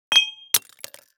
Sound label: Crash Wine Bottle Drop And Break; crashes; AdobeCC